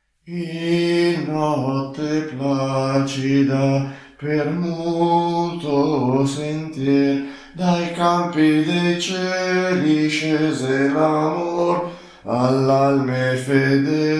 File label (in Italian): contralti; In notte placida